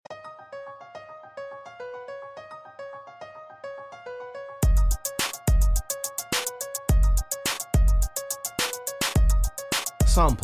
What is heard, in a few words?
Spanish Hip-Hop